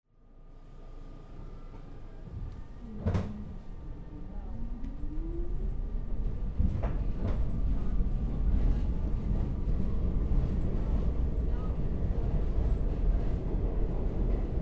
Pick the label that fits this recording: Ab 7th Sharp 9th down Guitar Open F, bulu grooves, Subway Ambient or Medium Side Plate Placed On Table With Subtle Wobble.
Subway Ambient